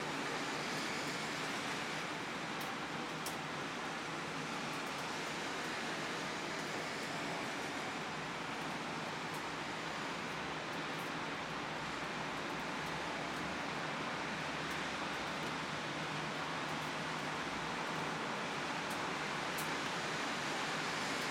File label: rainfall over birmingham